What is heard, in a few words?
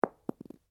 Cartridge 22 caliber short impact carpet, shell casings; shell casings of different calibers impacting on different surfaces